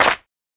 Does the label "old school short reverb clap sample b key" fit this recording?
Yes